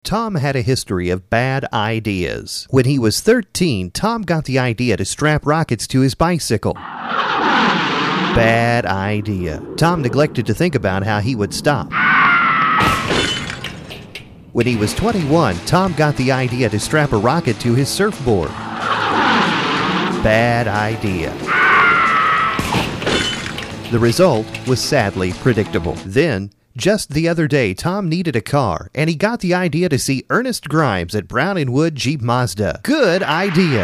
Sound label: Brown Wood Tom